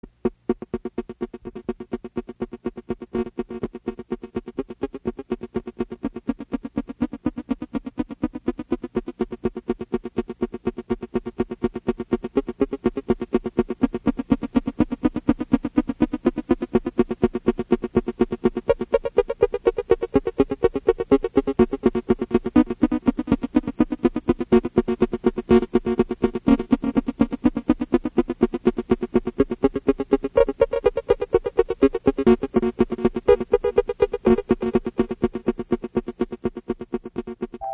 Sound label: Xmas Songs on Buchla